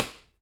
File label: simple dry flat basketball bou; sporting goods; nova sound hoops; sporting goods master collection